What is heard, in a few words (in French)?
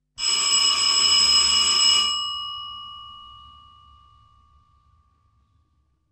Sonnerie, Sonnerie de porte